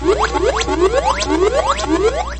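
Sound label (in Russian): запуск шарика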